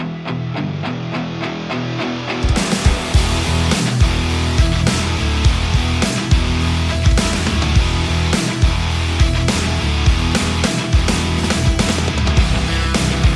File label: Guitar Instrumental Ringtone